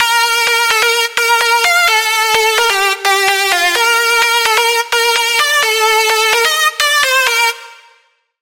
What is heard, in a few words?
Lead, Sylenth1; Routed Sound; Sylenth1 Expansion - Star Scavenger Vol.1